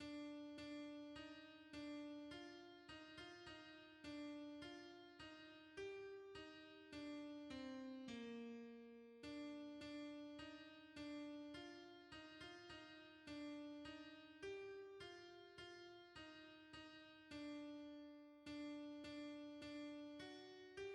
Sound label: alto; Gloire a Toi qui pour servir; hymns